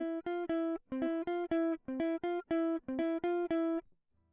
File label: electric